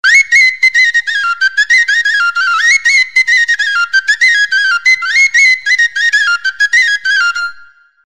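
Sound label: sopilka sopel pipe flute short melody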